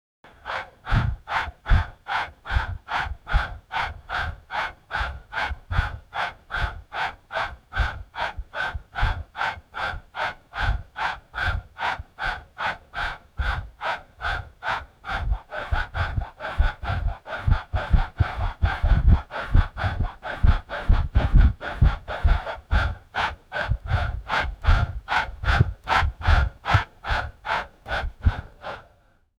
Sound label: meters sprint from a; 1500 meters sprint from a; sprinting